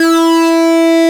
OBERHEIMS

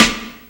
SD, dance drums hip; 90 s Sample CDs; Best Service Dance Mega Drums

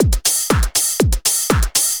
4 floor beat, trance

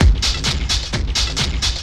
techno, DRUMLOOPS; Ueberschall; Techno Trance Essentials

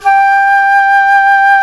jazz flute

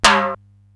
SDS9 RM, Simmons SDS-9, Rimshots

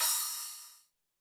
crash, Zoom MRT-3B

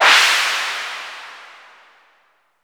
CRASH, DRUMS; Music Store Sound Service; Einstein

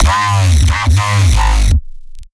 growl E, bass

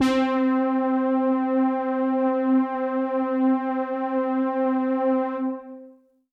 Pad C, Synth Prophet One Shots; Electronisounds

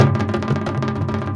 INDIA R, Heart of Asia, IND ENS; Spectrasonics - Heart of Asia CD2

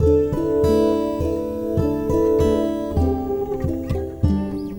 Guitar And Synth; Sonic Foundry (Sony Creative Software) - Friendly Fire

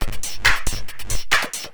percussion loop 138, techno; PS-45

